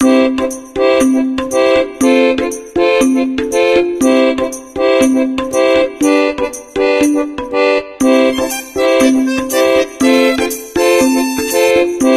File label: Harmonica, ringtones; Honor; X9c